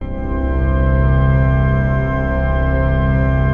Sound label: PAD, DIGI-PAD